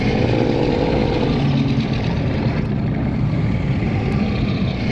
ravenous loop; LoL; yorick

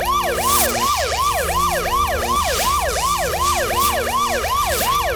power fist; LoL; blitzcrank skin07

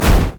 mage chains; LoL; ironforge xerath

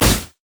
basic attack; leblanc

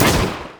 flamethrower; rumble

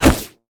electrical surge; LoL; kennen

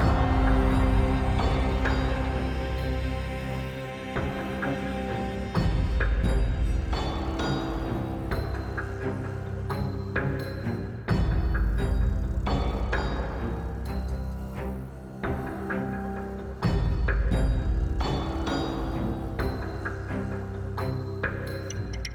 intro transition; crystalscar; lol

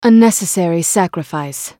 attack; Akali

